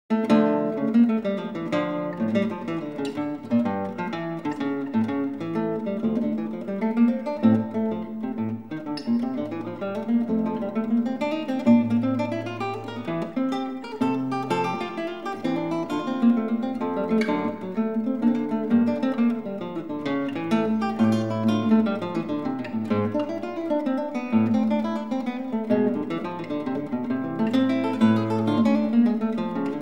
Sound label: Partita d-moll Corrente; J.S. Bach; Partita Nr. 2; BWV 1004